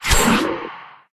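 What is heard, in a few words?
necromancer wound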